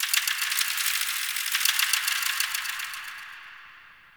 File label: High Mechanics, Ambience; Guild; Warframe; Misc Remaster